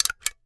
caulk gun pump, Pumping; Caulking gun being loaded and unloaded. Tools, Hand Clicking, Woodworking Carpentry, Pumping

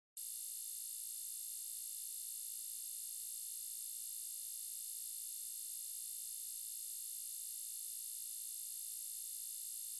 Alarm Beep Pulse; alarms

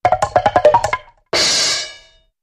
Ascend Temple Block, impacts

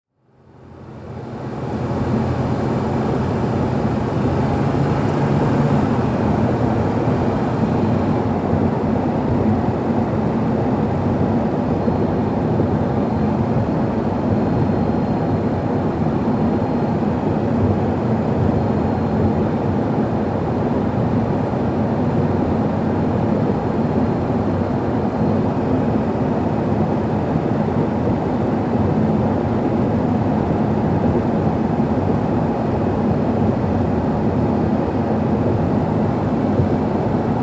SUV At 55 65 Mph Slows

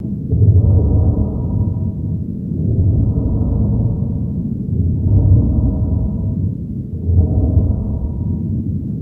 Bird Prey Industria, spaceship fx

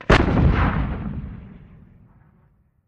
105mm Howitzer: Fires, Close-up. Dual

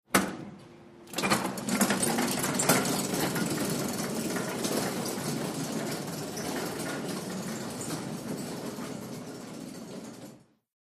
Gurney Cart Awy Slow; gurneys

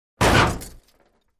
Crash Metal Shatter

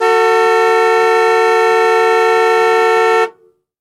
Jeep Cherokee Horn Honk; jeep cherokee 84

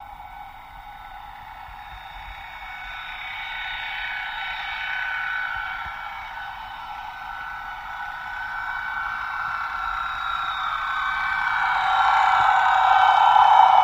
Torpedo Jet By, torpedos

